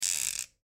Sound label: Silverware Fork, Silverware Fork Scrape Knife; Serrated Knife; Household; Dishware; Blastwave